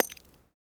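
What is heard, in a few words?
bullet drop snow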